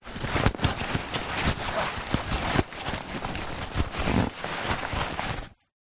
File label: foley bag backpack small full handling; zapsplat